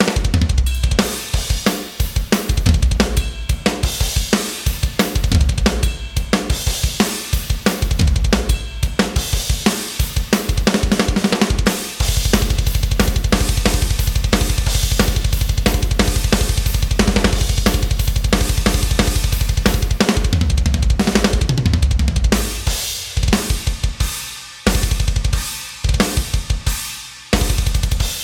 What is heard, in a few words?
Metal; Toontrack; Relentless Metal MIDI; Metal Mania EZX